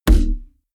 heavy saturated damped, hits impacts; whooshes rises

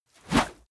whoosh dirty; swooshes-swishes

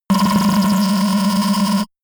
shot ringing wobble noise; pulsieren

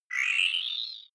game start evil

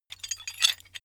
metal handling pieces

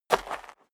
step dress boots gravel light single left; Footsteps; schritte

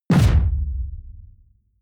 sci-fi shot tonal sub noise dull; schusswaffen

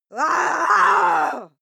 female aggressive scream; schreie; menschen

